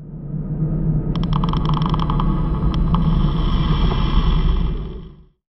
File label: noise; ESPIONAGE1